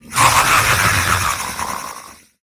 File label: Nerubian Prophet Death